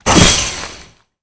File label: impact soft; Quantum Redshift